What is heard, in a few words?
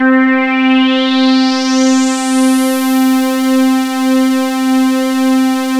RESO C3; Best Service Alpha Dance vol.2